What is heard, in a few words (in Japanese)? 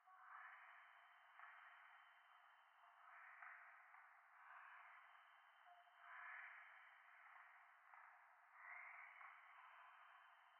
廃墟 右耳密着吐息 山奥